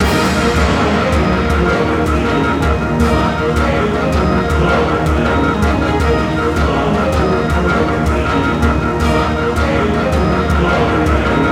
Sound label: Loop; Lost Epic